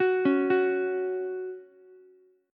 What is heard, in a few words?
Gb 5th Gb down Ukulele; Gb5 arpeggiated; JGuitar